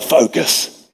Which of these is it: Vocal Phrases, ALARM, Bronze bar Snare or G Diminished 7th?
Vocal Phrases